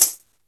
KOMMERZDRUMS

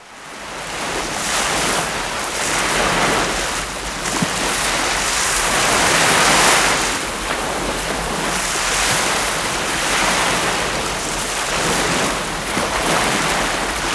SEA, SEA-WATER; Best Service - XXL Production Effects

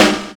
FILL; Univers Sons - Kitch 70s

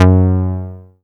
Rubber Bass; Best Service Gigapack 2

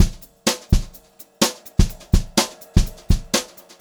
BEAT, Brushed Drum Loops; USB Soundscan vol.39; AKAI

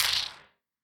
Wider Clap

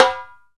Timbales rim; E-MU Producer Series Vol. 2 – More Studio Essentials; Composer; Timbales Xswitch

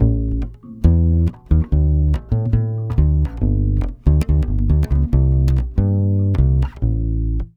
BASS GROOVE, Brazil Bossa; AKAI; USB Soundscan vol.16

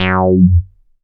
ROLAND JX8P F#2; Big Fish Audio - First Call Vintage Keyboards

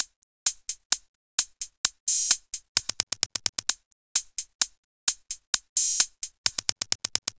Hi-Hat; Sonic Foundry (Sony Creative Software); Afterhours EDM

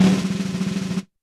Snare Roll, YAMAHA RM1X

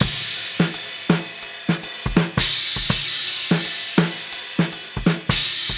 83 China Dirty, Live Jungle Breaks; Death By China

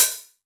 909 OHH; Goldbaby Tape808 & Tape909; The Tape 909

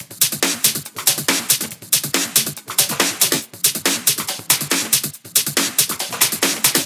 Loop Full, drum Loop 140BPM

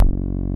SPIKE BASS; Masterbits; Dance Trax

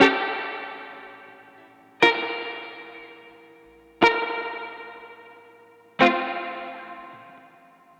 Guitar, 70s Analog Funk

